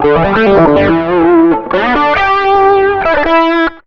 SOLO L, Electric & Acoustic Guitar Loops

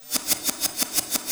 Cymbol Particle; Particles

